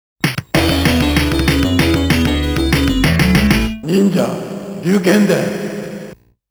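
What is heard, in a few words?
Round Clear (arcade); Ninja Gaiden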